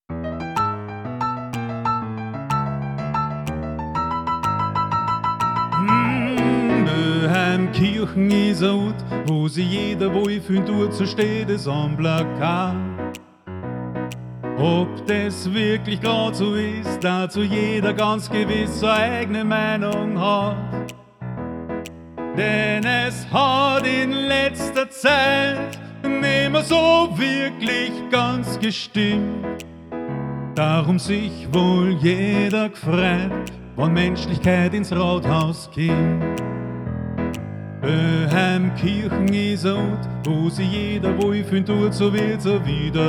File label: Blues